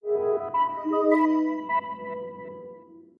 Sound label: Ui Launch mission